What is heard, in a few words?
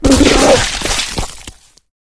flesh destroyed large; flood